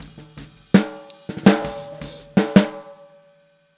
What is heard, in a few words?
Boogaloo - Outro - Snare, 82 BPM; Sonic Foundry; Drums from the Big Room The Mixes